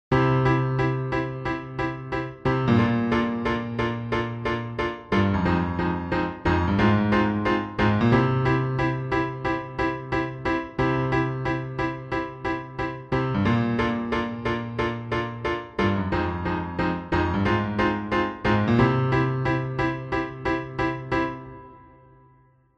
piano

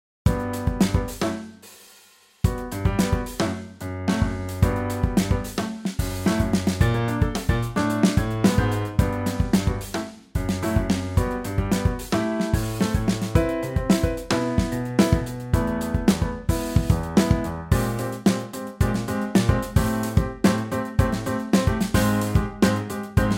kick snare hihat

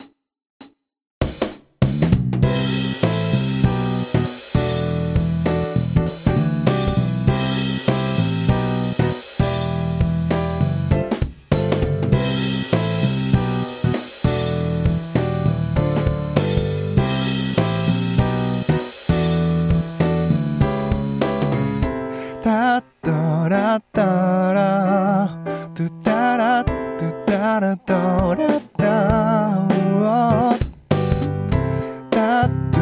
song bass

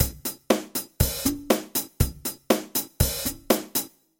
kick snare hihat

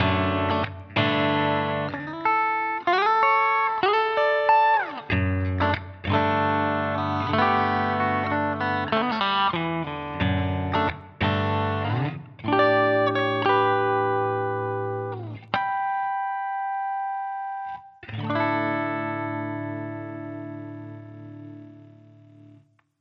guitar outro

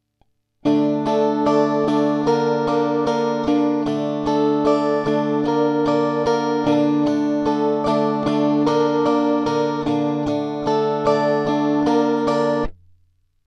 sakura mix guitar pure

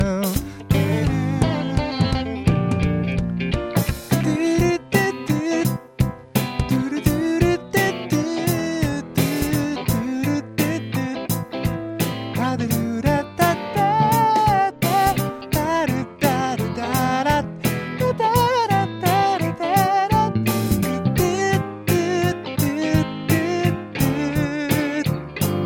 second guitar

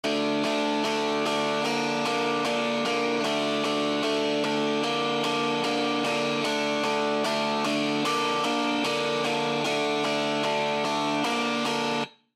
guitar after

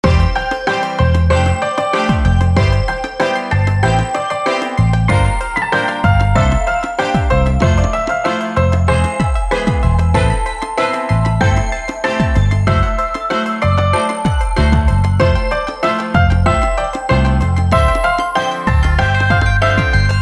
basic

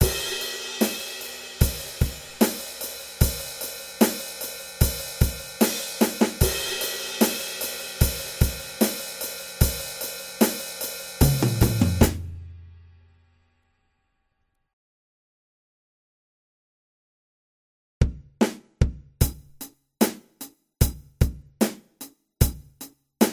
drums

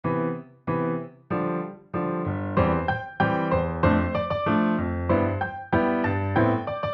bass in

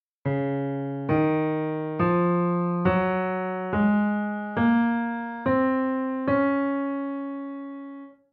c major

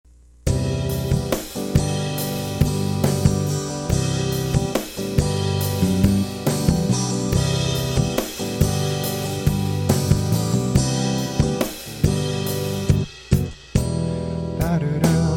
rock

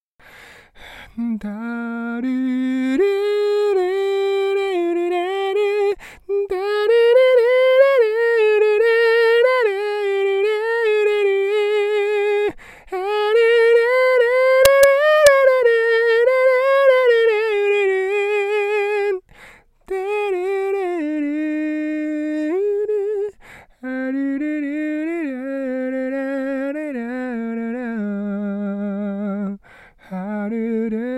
vocal

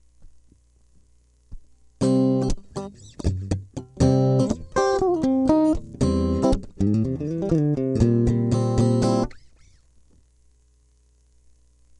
guitar

